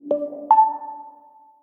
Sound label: Dudu; Gionee ELife-E7